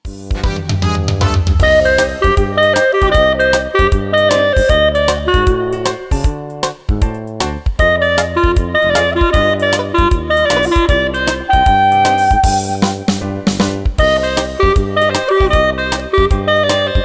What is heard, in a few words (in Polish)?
klarnet